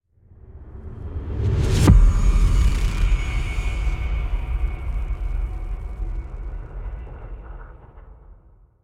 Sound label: Impact w Sweep; B Path of the Teal Lotus; KSHMR